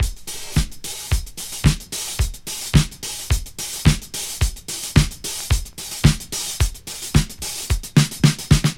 110 bpm drum beat d sharp key, kick tuned to the D# note. Loudest frequency: 1829Hz